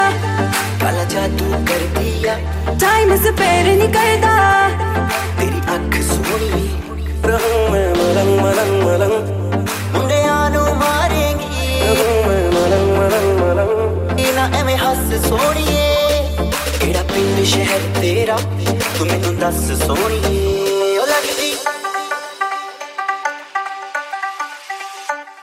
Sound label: dj remix song ringtone